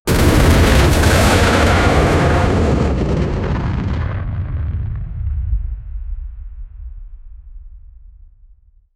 cinematic and realistic, aircraft

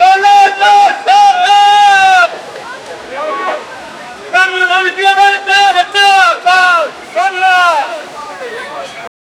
market, sound-effects

